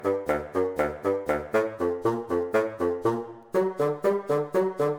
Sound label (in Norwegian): fagott